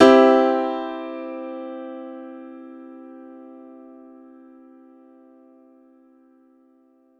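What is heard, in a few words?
Piano Grand major hard; TrashLibrary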